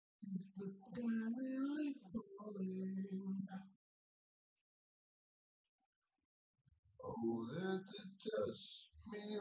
nodrums synthconv; SiSEC08; SiSEC underdetermined

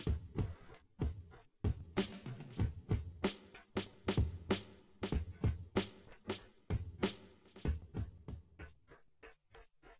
wdrums inst sim

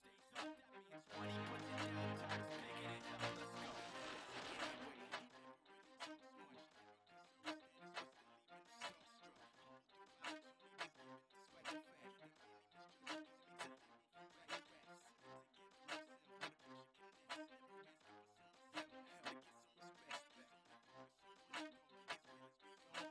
claps; SiSEC11

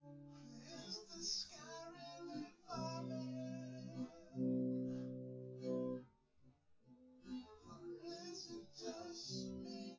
no drums, synth conv, 250ms, 1m; SiSEC10; underdetermined

